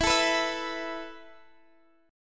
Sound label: E Suspended 4th E, down, Guitar, DADGAD